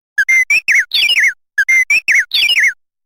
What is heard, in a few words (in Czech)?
melodie